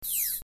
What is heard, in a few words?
minimize